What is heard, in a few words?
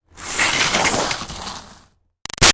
Snow Slide By Icy Scrape Bumpy; Skiing and Snowboarding; Sound Effects:Sports; Big Room Sound